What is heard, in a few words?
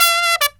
TRUMP, Killer Brass Riffs